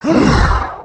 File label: idle, creatures organic